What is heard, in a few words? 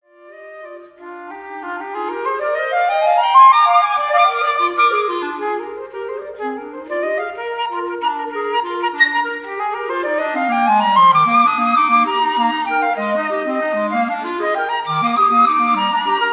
Three Little Waltzes